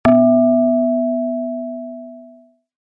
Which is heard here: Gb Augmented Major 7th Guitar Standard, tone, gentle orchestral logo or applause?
tone